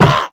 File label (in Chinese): 受伤声; 潜影贝